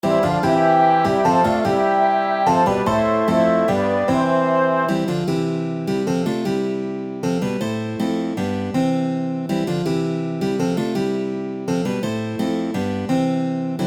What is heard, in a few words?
a new years carol instrumental